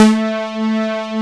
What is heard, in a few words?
ACID BASS; ACID LN